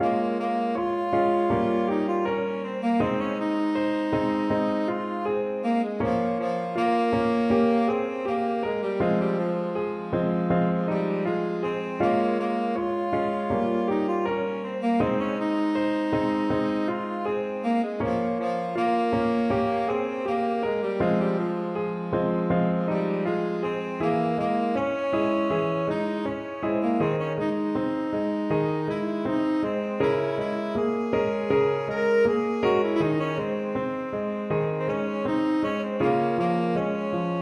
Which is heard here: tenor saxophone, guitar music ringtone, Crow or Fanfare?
tenor saxophone